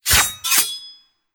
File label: Skill Ninja Blade